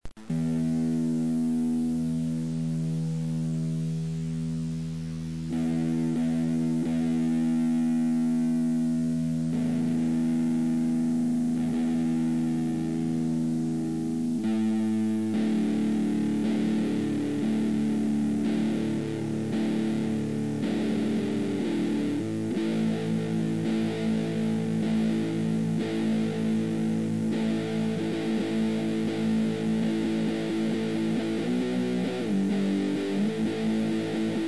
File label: guitar